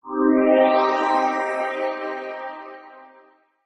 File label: Achievement unlocked; Secrets of Magic: The Book of Spells